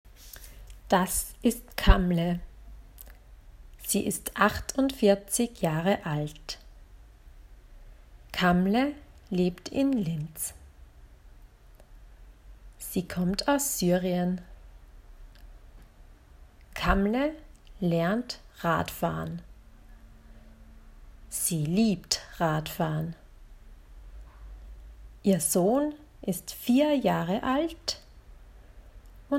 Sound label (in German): Kamleh langsamer